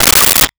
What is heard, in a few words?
Plates Removing